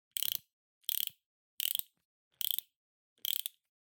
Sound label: Music Box-Foley (Wind Up)